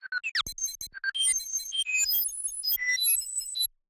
Signal, Alien Sound Effects; Cali Crazed Beats

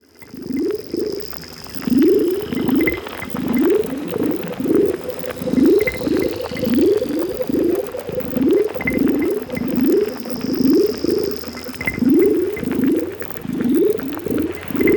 ambience scifi night creature, Alien Planet; BlueZone

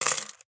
many coins; CB Sound Design; Essential Sounds Vol.01 Coins

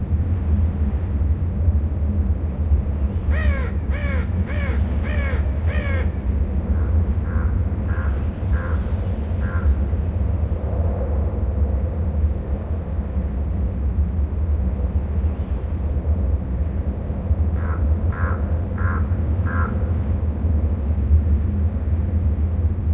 town ambience; hl2

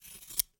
Scissors Slow Snip; Fascinated Sound; Scene Shop Tool Box

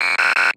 Deny Access Signal Lo-fi; Effectsworks; Tech Future

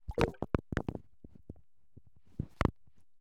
Water Rock Movement; InMotionAudio

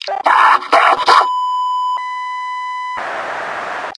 fire scream; hl2; metropolice

